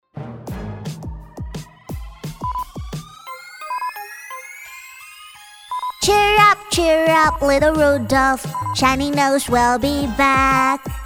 女 英语儿歌 童声歌曲

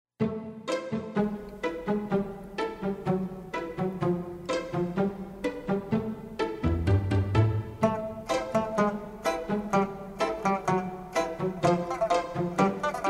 歌曲类; 儿歌

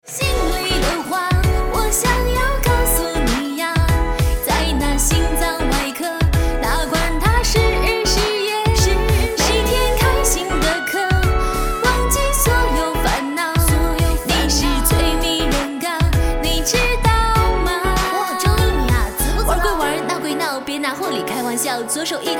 女, 说唱

